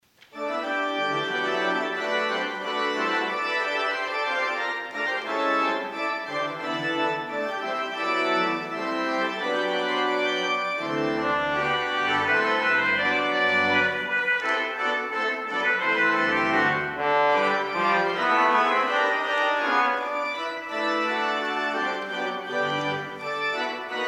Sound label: Three Short Hymn Settings, organ